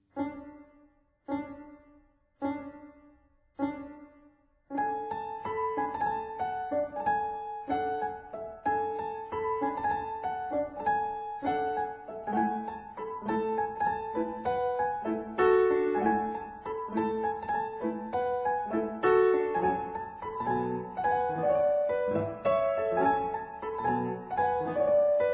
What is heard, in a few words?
Gangar Piano; Etter Myllarguten; grieg